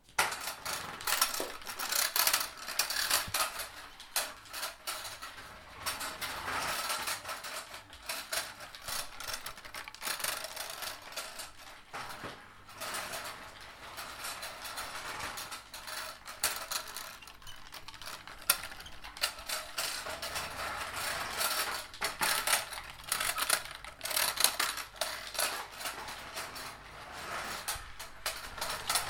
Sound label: plastic toy wheel pushed by a toddler, Little boy pushing his plastic toy wheel in the livin